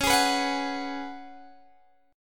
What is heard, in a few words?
C sharp 7th Suspended 4th C sharp, Guitar Open G